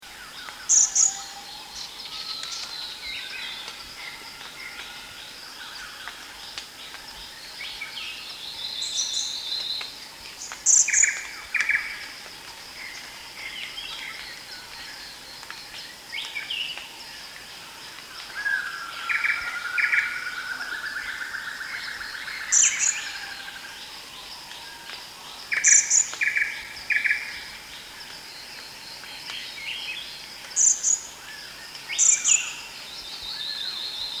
vernal hanging parrot

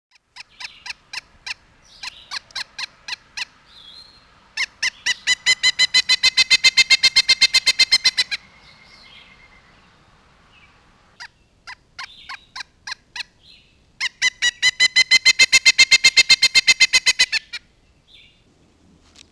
pileated woodpecker

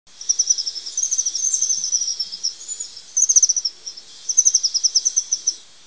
steely vented hummingbird

